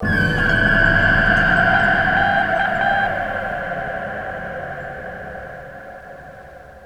Space; Electronic Point Blank

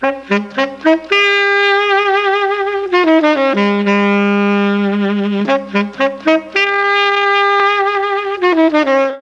D#4 SAX, Mellotron; Mike Pinder - Mellotron Archive CD; FOXTROT+SAX

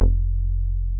JX JACK F2, JACK BASS; Drums In Your FACE!

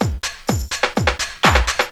DRM 125, House; Best Service ProSamples vol.10 - House; AKAI

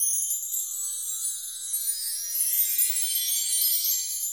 CHIME, ANALOG ORGAN; EdgeSounds; Drum Mashines VOL-1

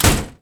door, Sound Effects

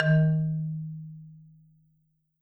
D#2, Marimba; hard F; Sampleheads - Dave Samuels Marimba & Vibes

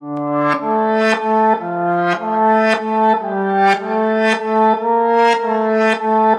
150BPM Guitar Clean D min; Antidote Advent

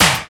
snare, Snares; Roland L-CD701; Snares 5; Sn Modules 5

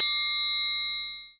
TRIANGLE, PERCUSSION; GM PERCUSSION KIT; AKAI S6000 CD-ROM - Volume 5; GM PERCUSSION KIT 2

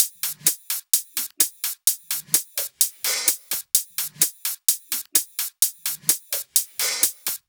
Loops 128BPM, Drumloops

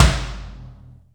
heavy k, Yamaha RX-5

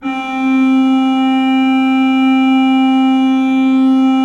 HGRP C3, Cathedral Organ, HOLZGEDACKT; Propeller Island

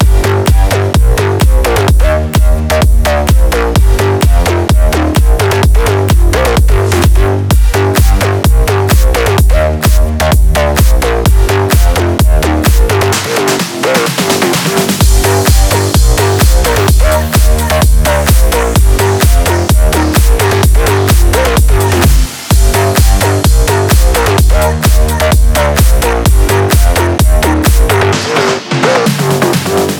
128BPM Mix, Root Gmin; Amstertaco; Vengeance; Essential Clubsounds Vol.5; Demo Constr Kits

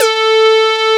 PL MOOG; Wizoo - Powered Nord; STEREO LAYER

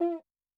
Cuica; Yamaha DTXpress-II; Perc

